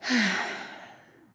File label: nv sigh; smite; awilix